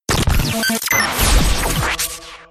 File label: beat